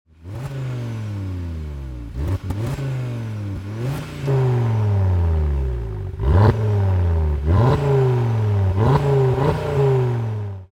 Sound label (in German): AGA Klappe zu auf Stand, Abgasanlage Audi RS 3 Sportback 8V; Stand Klappe geschlossen; stoll sport; Stand Klappe geschlossen / offen